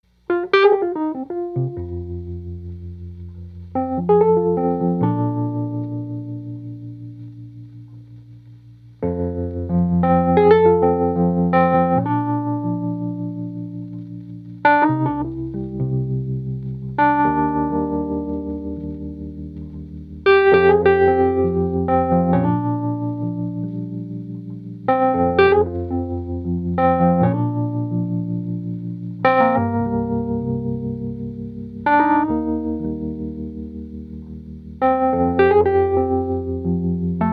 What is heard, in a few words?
Wurly